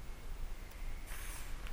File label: whistle